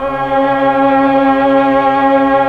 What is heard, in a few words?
PAD, Slow Str+Vox